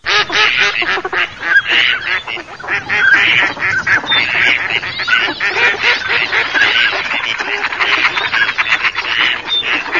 GERMA CODO CANAPIGLIA

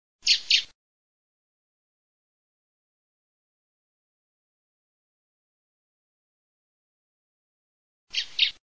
MERLO FEMMINA noise

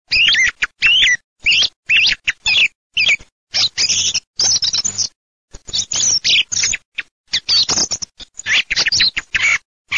tordo, birdsound

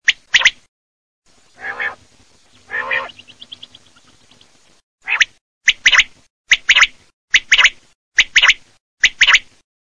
QUAGLIA

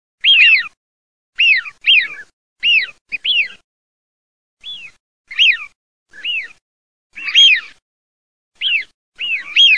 fischione, birdsound

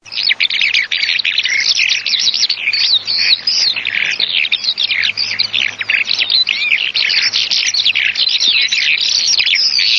allodole

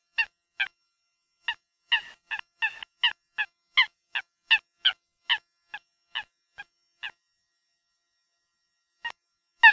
FOLAGA

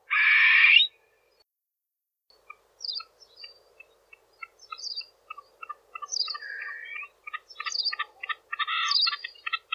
Pernice sarda

